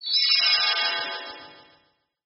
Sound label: Effect Teleport